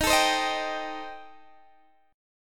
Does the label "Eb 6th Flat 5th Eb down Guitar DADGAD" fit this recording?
Yes